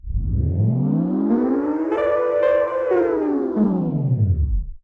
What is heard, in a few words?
Start Stop